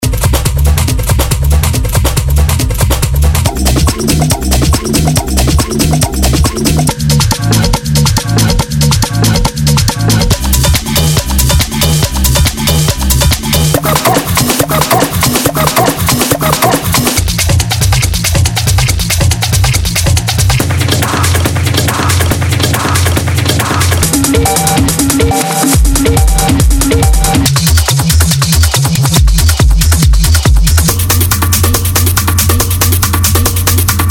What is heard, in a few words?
pressure loops